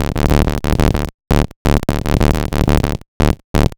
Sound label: Big Dirty, Bass; Sonic Foundry (Sony Creative Software); Underground UK House & Electro